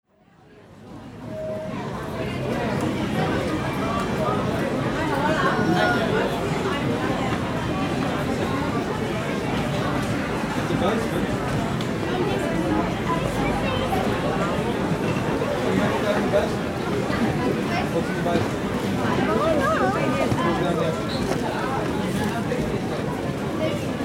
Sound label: shop in london